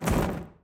flap; fallen

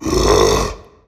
growl; floating knight

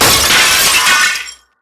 mirror break

